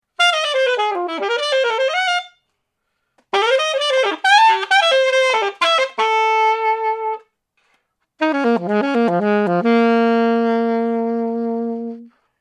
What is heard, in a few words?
Alto; Susutake